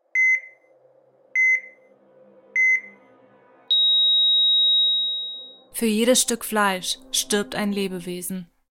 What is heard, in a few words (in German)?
Klingelton EKG; PETA